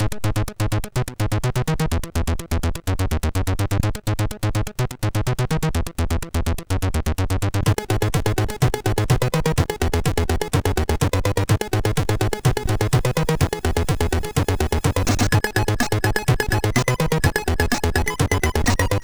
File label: Droid, MOS6581R2